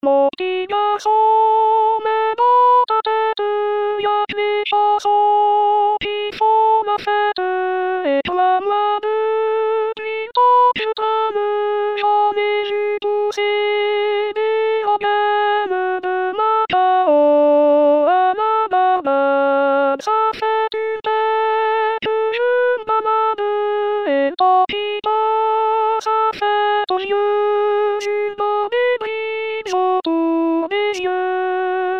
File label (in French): refrain alto